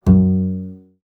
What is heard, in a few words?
f sharp pizz